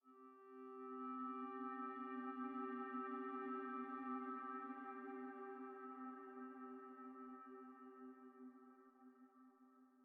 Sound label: Env Field Night Cave